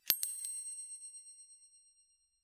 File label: bright coin clink